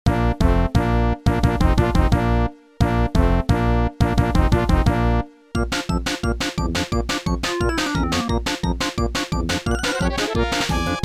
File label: Earthworm Jim Theme (No SFX) (Sega Genesis Instrumental Remix); SupremeMIDI